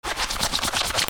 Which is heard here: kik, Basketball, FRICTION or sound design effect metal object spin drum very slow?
FRICTION